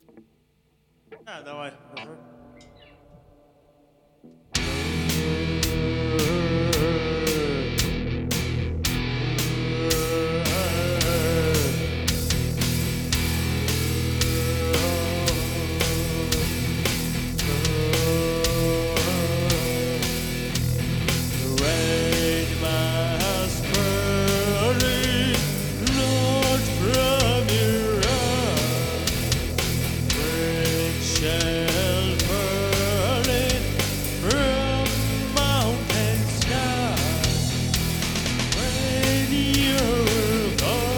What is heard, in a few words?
rain live